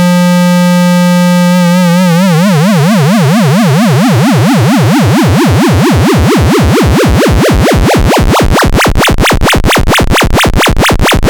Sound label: vco freq mod from lfo tri wave; analog synth 101